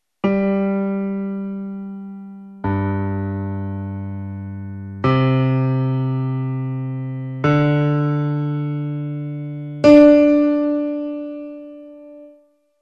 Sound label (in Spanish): graves sol m